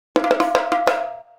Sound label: Indian Drum Fill; Sonic Foundry (Sony Creative Software); Essential Percussion; Drums